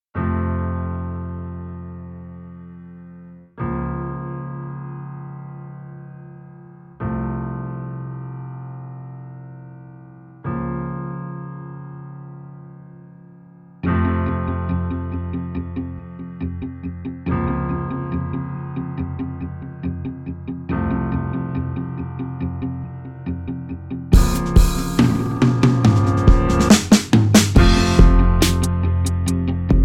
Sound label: keys